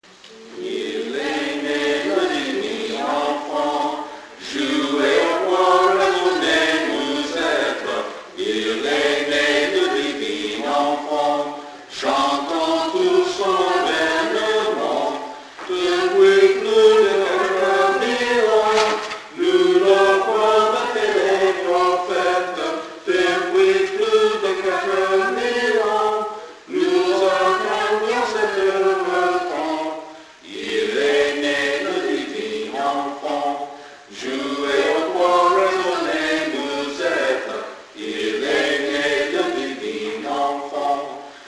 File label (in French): Il est né le divin ensemble, choir anthems